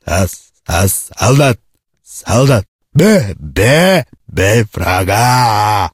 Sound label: zombie attack; STALKER; customnpcs